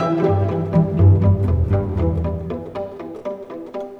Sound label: Strings Pizz, Cinematic; Sonic Foundry (Sony Creative Software); Orchestral Series VOL-3